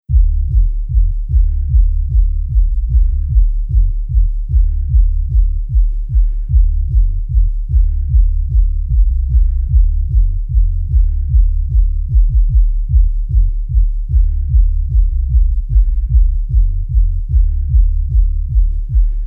Hyperborea 150 bpm

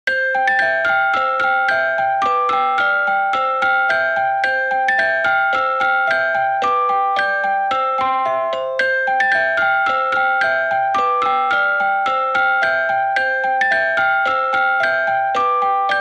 London Bridge, Ice Cream Truck

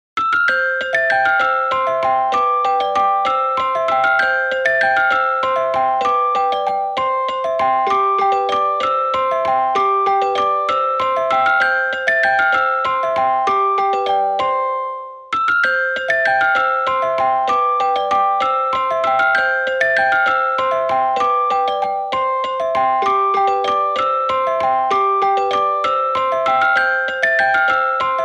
Ice See a Lassie

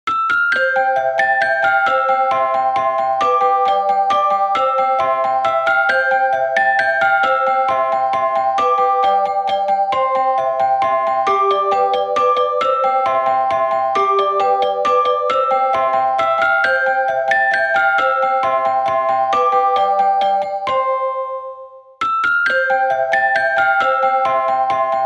ICE Cream Truck; See a Lassie

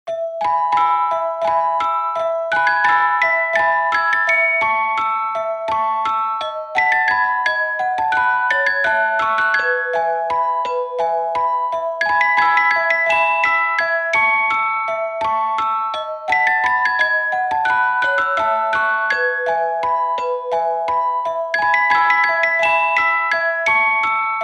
Ice Cream Truck, Ballerina

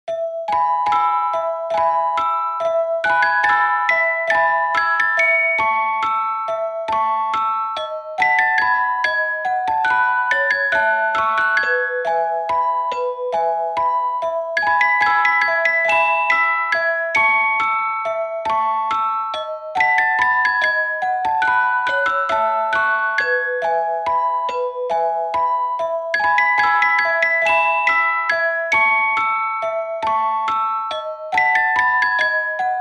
Ice Cream Truck, Ballerina

